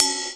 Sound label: Linn Ride